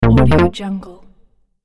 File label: retro get ready action